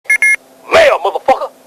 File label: mail